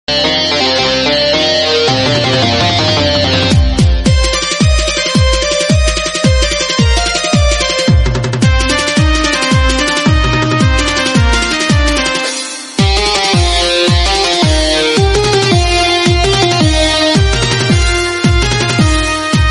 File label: bgm islamic; muharram